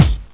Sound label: kick, drums; Zoom - Release 2; Active Software